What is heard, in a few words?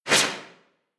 notification; samsung Aleart